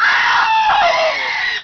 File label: BANSHEE